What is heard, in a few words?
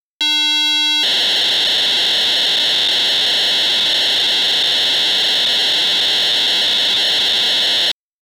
PO-32 data transfer sounds; Tonic Drums